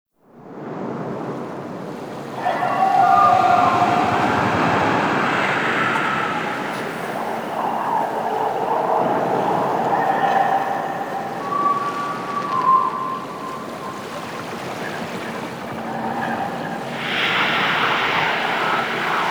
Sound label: Ambience; Garren Quest Intro